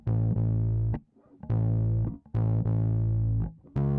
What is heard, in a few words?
bass; musdb18